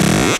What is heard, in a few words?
Scratching FX